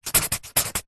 office felt pen short squiggle stroke on paper handwriting